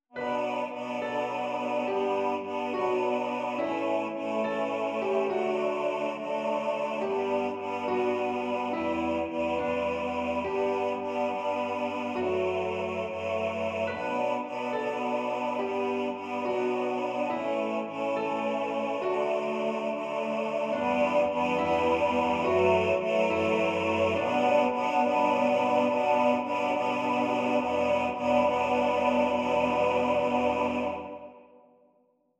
Wie der Wind Akkordeon

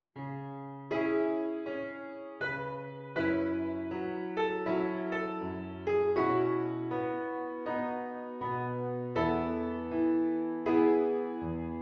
Klaviersatz; Freud und Leid

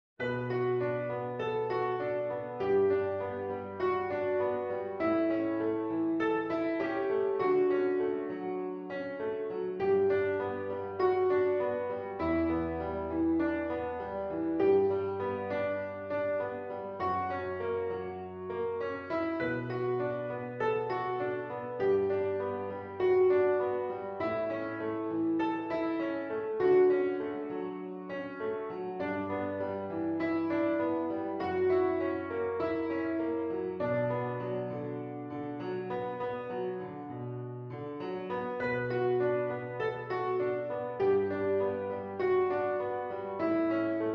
Wie der Wind Klaviersatz

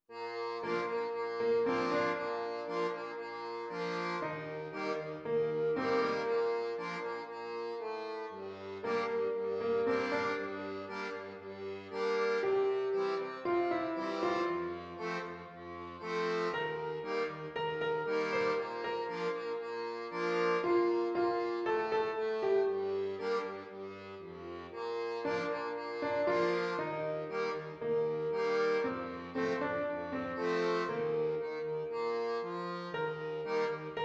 Akkordeon

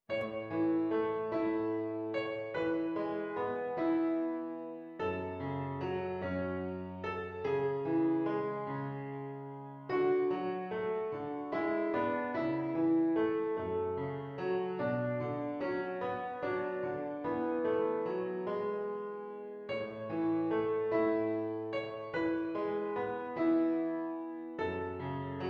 Spring in die Fluten Klaviersatz einfach; Cramer

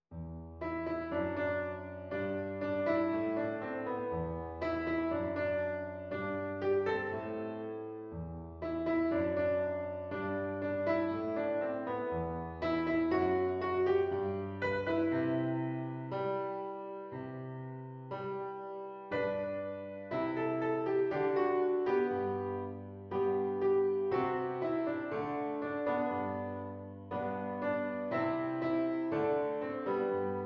Klaviersatz einfach; Cramer

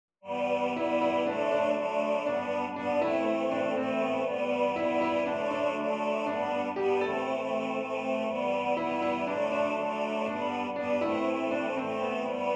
War doch friedlich Akkordeon

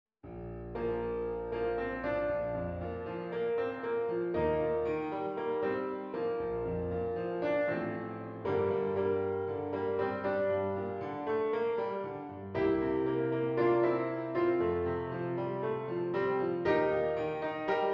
Klaviersatz voller; Cramer

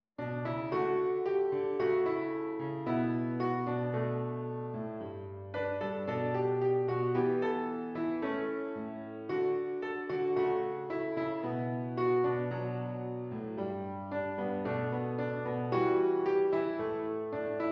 Klaviersatz

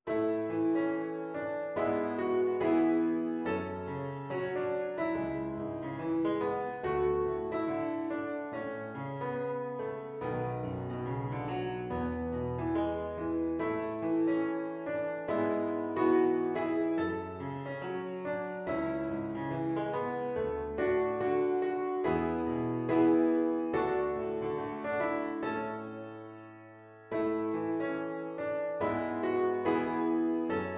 Wieder nach langem Weg Klaviersatz voller; Cramer

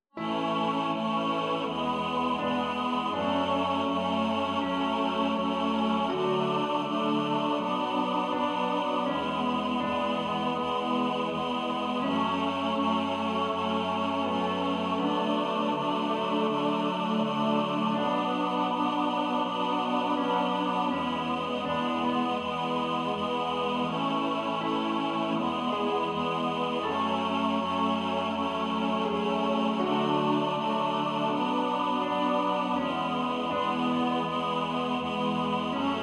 Fliessen Fliessen Akkordeon

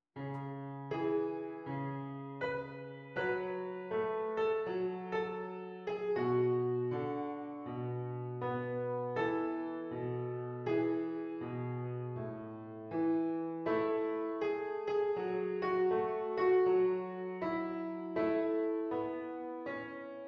Klaviersatz einfach; Freud und Leid; Cramer

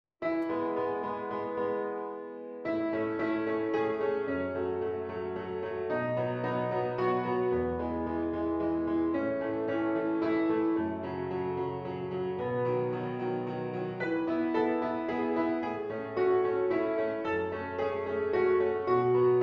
Wo immer im Streit Klaviersatz

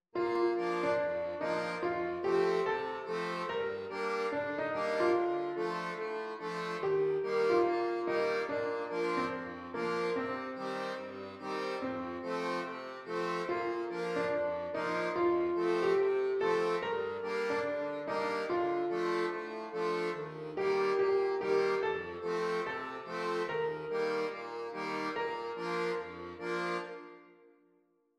Wieder nach langem Weg Akkordeon

